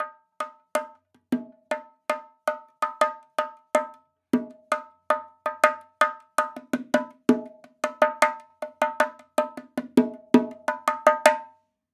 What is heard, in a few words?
afrobongo loop 80; perc construction loops; bongos afro; SEISMIC Drum Sample Pack; Slate